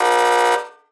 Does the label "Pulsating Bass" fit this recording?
No